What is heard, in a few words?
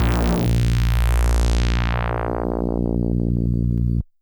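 DX100 A#0; rocket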